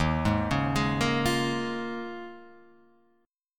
D sharp 7th Suspended 2nd Suspended 4th, Guitar, Half Step Down